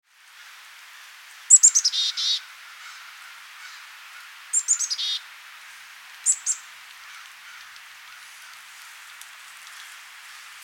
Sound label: Black capped Chickadee Poecile atricapillus